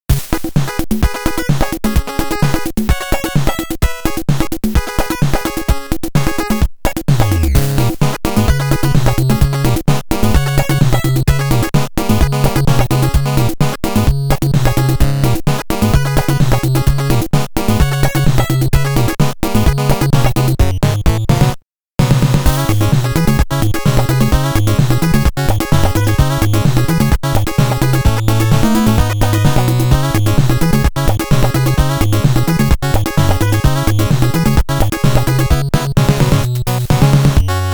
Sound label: Side Scroll BGM; konami famicom chronicle vol.3 rom cassette edition